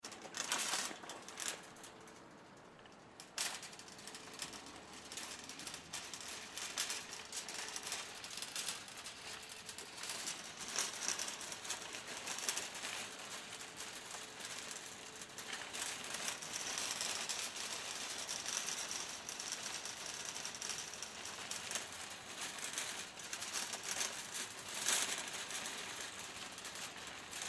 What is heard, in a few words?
Shopping Cart, Shopping Cart Push To Car; Rolling; Industry; Objects